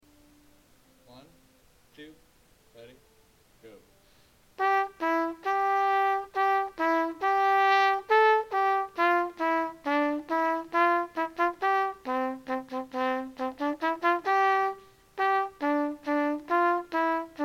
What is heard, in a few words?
this old man in c major